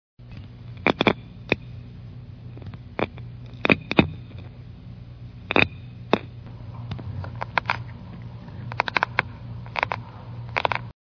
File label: Parrotfish